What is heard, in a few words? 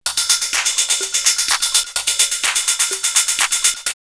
High Hats 126, drumloops, Techno&House; X-Static Goldmine